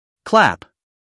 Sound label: clap